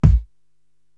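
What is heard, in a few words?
bass drum Deep R&B, Korg TR-Rack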